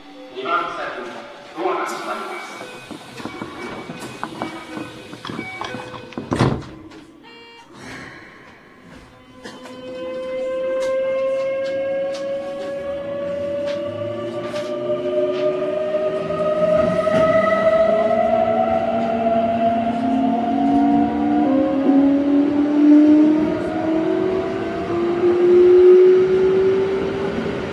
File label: vvvf, mitsubishi; keikyu 600